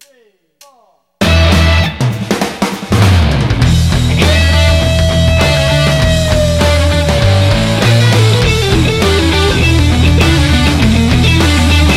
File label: Heli Rock; The Hell in Vietnam